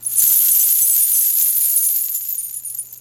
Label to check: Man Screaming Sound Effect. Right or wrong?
Wrong